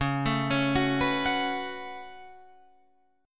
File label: C sharp 7th Suspended 4th Sharp 5th, C sharp, down, Guitar, Open F; arpeggiated